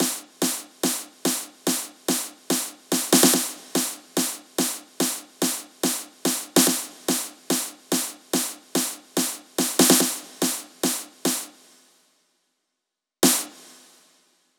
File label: 144 BPM Roll Snare; Vengeance - Essential Clubsounds Vol.5; Flight Kit Root Amin; Drums & Perc